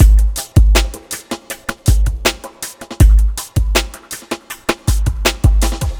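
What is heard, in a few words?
Polarbeat, ambient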